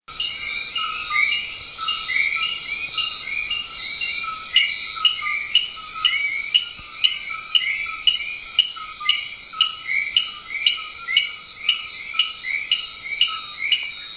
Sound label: eleutherodactylus hedriki el yunque national forest puerto rico; Its unmistakable call is a loud, evenly spaced and dry staccato, "keh-keh-keh...", which lasts several seconds.; West Indian